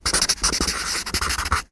Magic marker writing on paper. Writing, Marker Pape